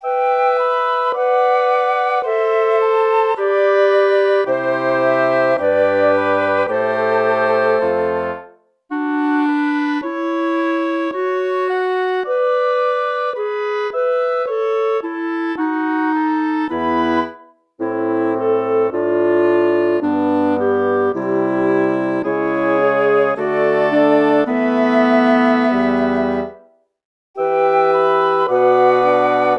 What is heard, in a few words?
for woodwind quintet; Jumping Bunnies